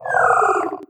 idle, headcrab reviver; creatures; vox

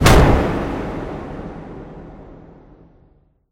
door impact, vault; scripted sequences; clowndoor; Half Life Alyx

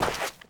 sand, footsteps; player

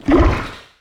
paint blob inception; Portal 2

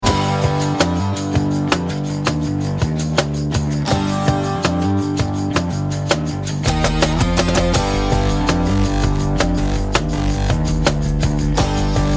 start round, music; hades

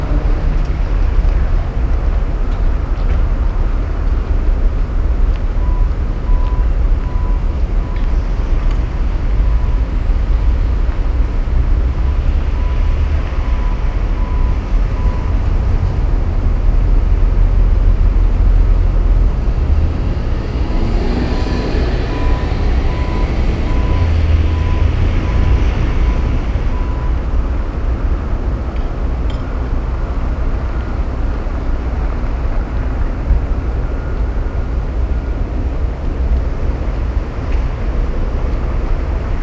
exterior; ambient; Counter Strike Model Pack; CS:GO; nuke

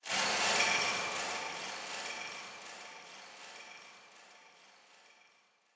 clicking echoes, ambient creatures; Half Life Alyx

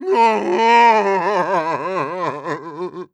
idle moan dry, zombie vox; creatures

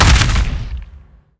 body impact soft, antlion; creatures

